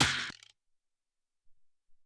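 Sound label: Hop Snare, Alesis SR-18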